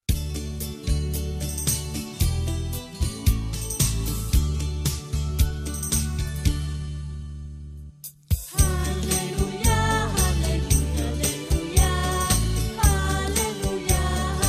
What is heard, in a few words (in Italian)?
Canto per cristo Alleluia; canti di chiesa; Avvento